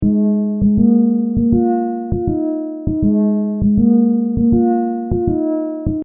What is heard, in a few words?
instrumental